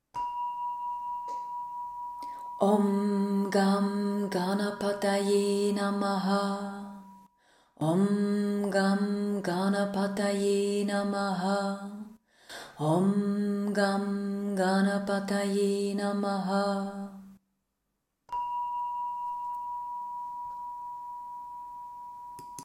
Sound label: Gaṇesa Mantra